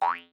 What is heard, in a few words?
Acoustic Doink